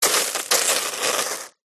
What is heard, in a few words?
foley metal hot water drips into sizzle; zapsplat